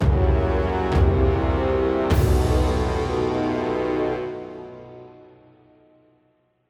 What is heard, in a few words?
Combat Arena (Lose); Avatar The Last Airbender The Burning Earth; wii; xbox 360